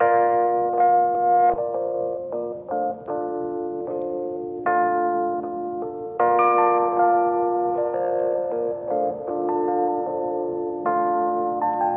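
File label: layered D minor 155 bpm; Autumn Vibes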